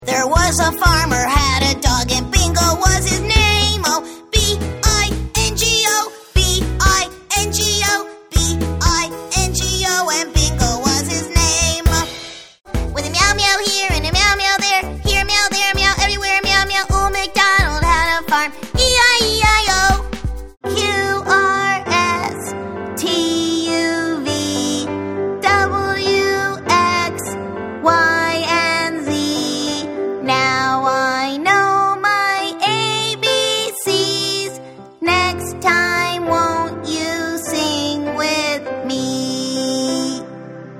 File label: Songs; US